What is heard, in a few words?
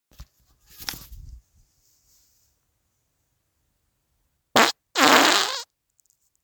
just a fart; insult to music